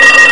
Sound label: Alarm Clock